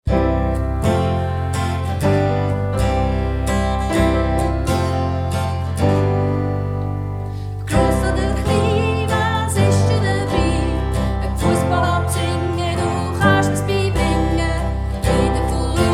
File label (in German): Song Schule Wolhusen mit Bass